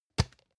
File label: plastic can small lid close hard